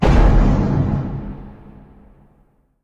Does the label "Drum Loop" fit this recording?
No